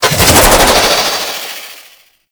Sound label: Manic Dodge Movement, Foley; Warframe; Grineer